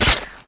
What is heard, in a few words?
metaller die